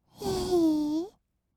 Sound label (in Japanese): "えへー", kawaii voice; 1200 kawaii voice!!